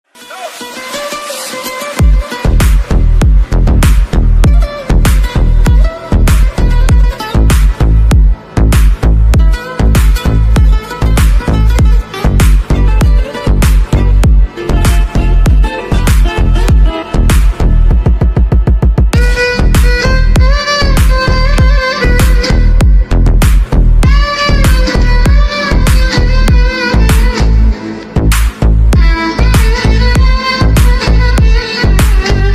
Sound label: instrumental remix